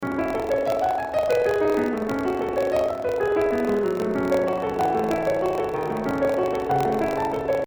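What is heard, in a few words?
Debussy Arabesque 1 mistakes; Fehler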